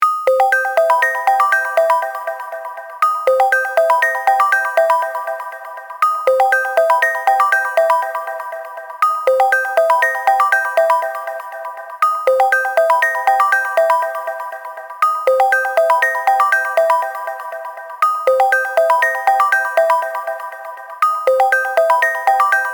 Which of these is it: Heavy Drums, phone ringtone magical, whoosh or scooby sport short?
phone ringtone magical